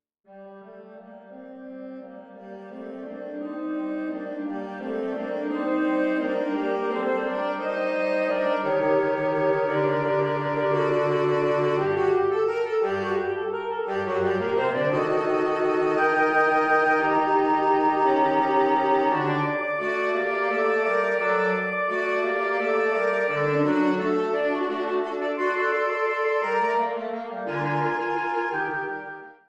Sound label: Quartet Presto Vivace Theme; Schubert